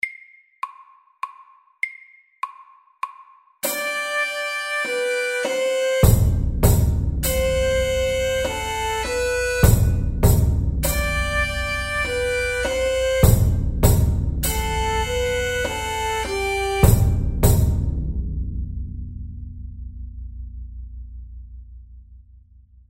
Stomp FORT

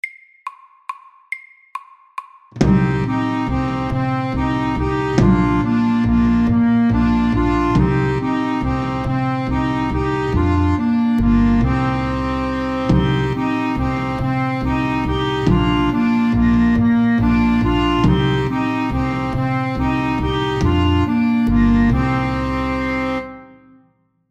Sjanti MEDIUM 140

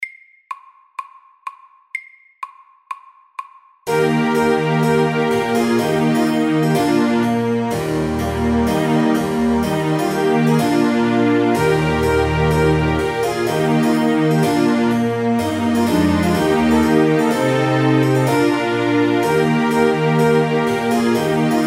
Trumpet tune FORT

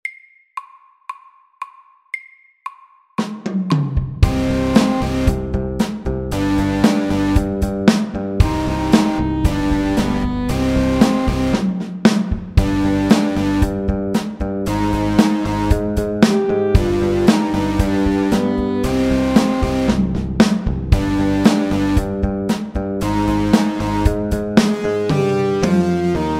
Elektrisk rock, 115

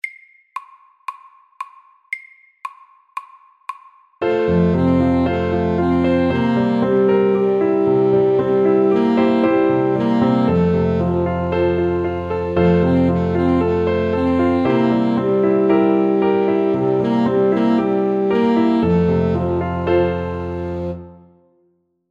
Walesisk melodi FAST 115

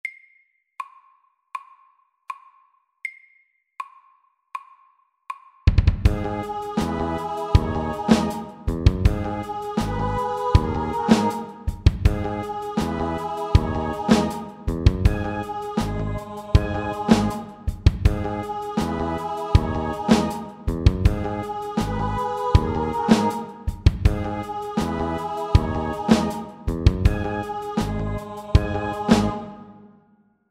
Octave rap FORT

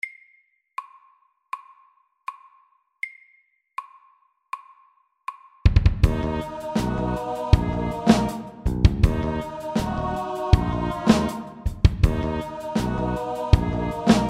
Octave rap FORT

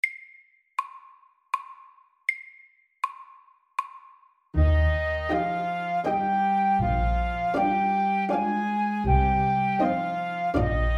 Legato vals SLOW

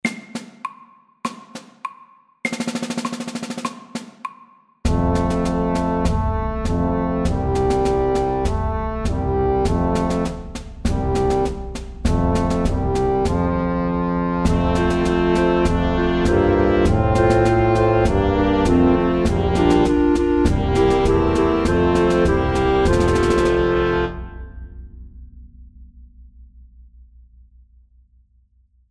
Kryssmarsj MEDIUM 100

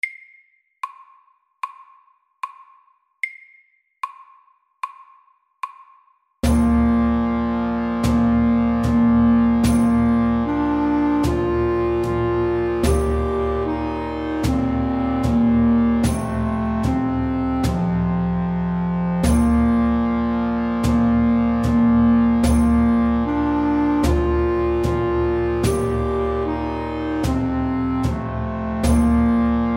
engelsk melodi SLOW

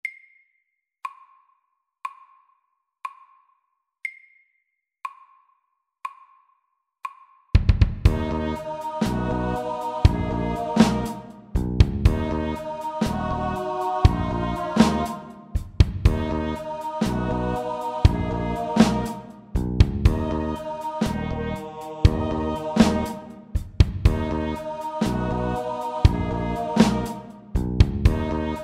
Octave rap SAKTE

